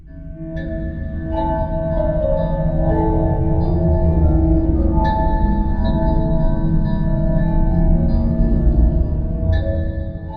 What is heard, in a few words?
Amb bells